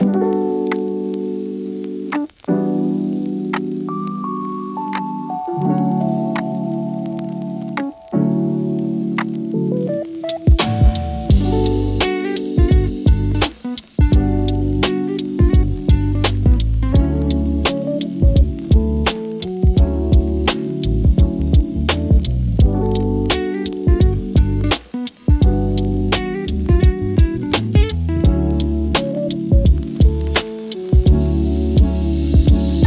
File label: a soft study lo-fi; calm pencil